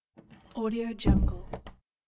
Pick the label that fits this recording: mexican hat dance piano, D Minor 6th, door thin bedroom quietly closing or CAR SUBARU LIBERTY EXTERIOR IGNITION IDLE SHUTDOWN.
door thin bedroom quietly closing